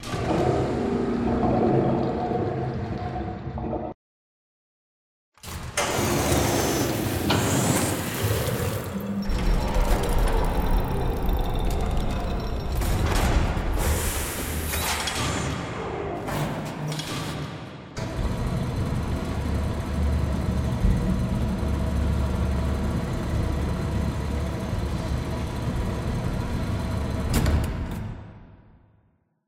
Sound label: Molding Room button all sounds